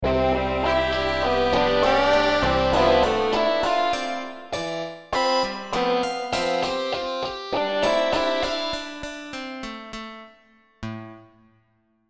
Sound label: startup song